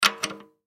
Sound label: Drum Key, Drum Small Place Key On Lug Nut; Drum Kit; Blastwave